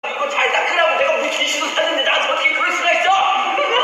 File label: yelling